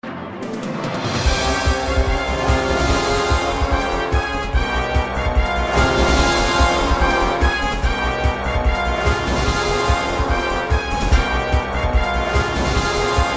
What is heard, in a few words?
1 MILLION WIN; USA 2020; Millionaire Soundtrack; US Revival (2020)